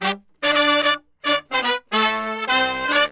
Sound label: brass 110 G, brass murga; Best Service ProSamples vol.14; World Grooves; AKAI